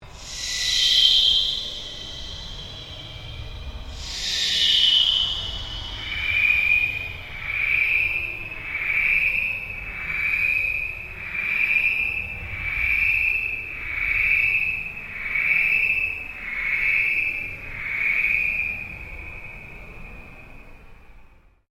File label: Ambient Drone Slow Sweep